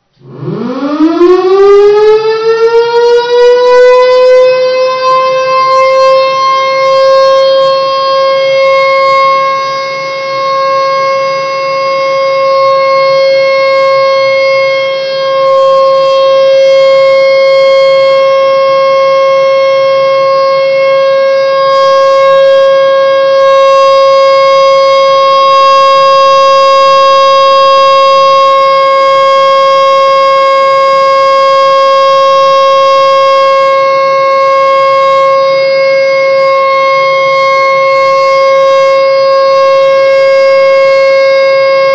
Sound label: Tornado Severe Weather